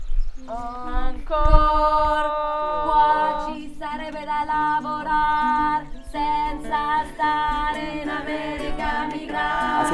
Italia Bella ALTI; Pellafol

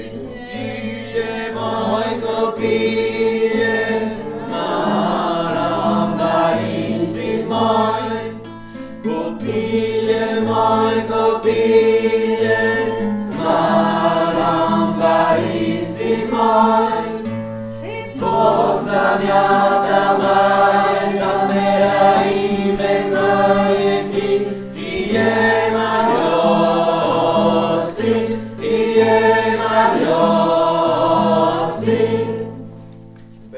VOIX 3; Kopile moj kopile

